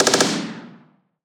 pistol

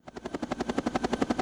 helicopter

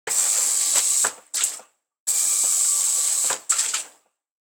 sink

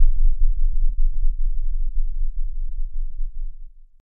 ULF 4Hz 25Hz Infrasound

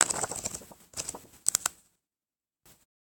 book

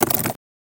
typing

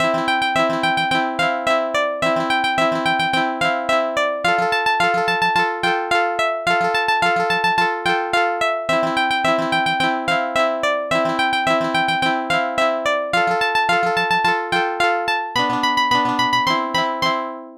first village

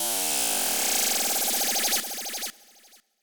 laser

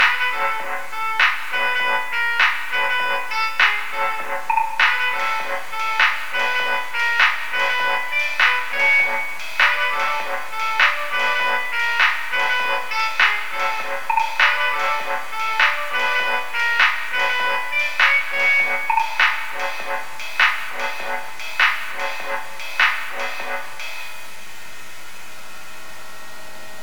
strange melody

